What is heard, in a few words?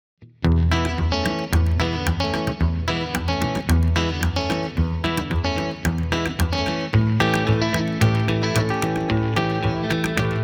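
mystery bass guitar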